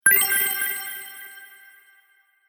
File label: Sparkle Idea, magic sound effects